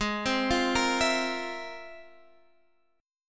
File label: F Minor Major 11th, G sharp